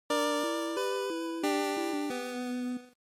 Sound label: Game Over; GB